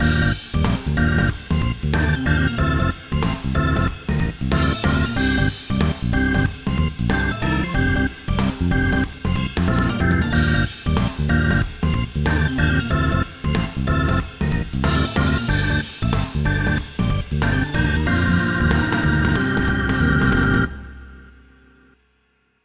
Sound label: Jackpot